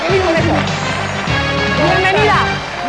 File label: Fanfare, Regular guest fanfare